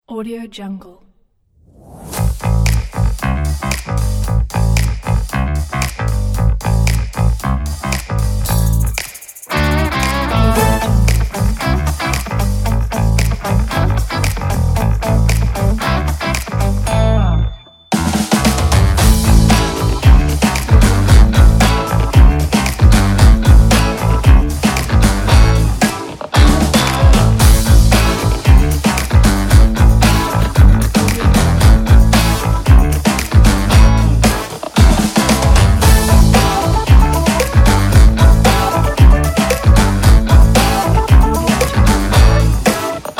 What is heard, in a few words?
funny funk